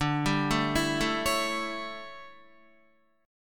D Major 7th Suspended 2nd Suspended 4th D down Guitar 4F 4A 5C 5G 5C 6E; DM7sus2sus4; arpeggiated; JGuitar